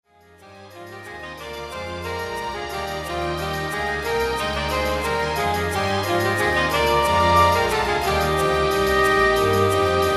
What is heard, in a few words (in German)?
Saxophon-Konzert